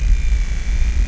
trap loop; quake2; weapons

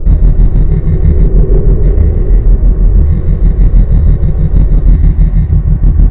portal, weapons; quake2

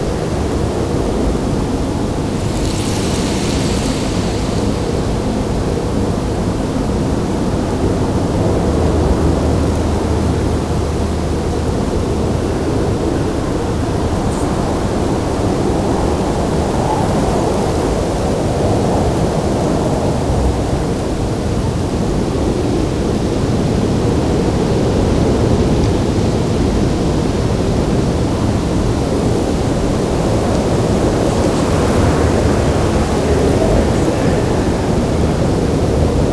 desolate winds; quake2; fox

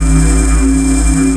disrupt, weapons; quake2

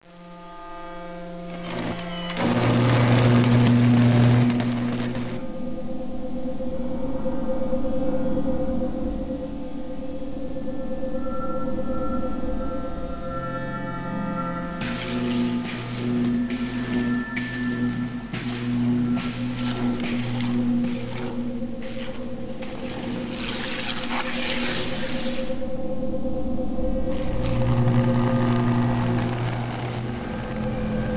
ufo; quake2

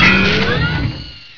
buzz fire; quake2; weapons

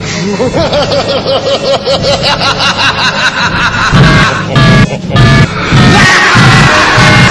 laugh; quake2

